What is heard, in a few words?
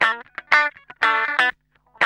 GUI EDDY 120 M, 120 LICKS